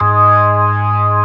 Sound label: Organ B3 Hammond, G#2; AKAI S-1000; Best Service ProSamples vol.55; Retro Sampler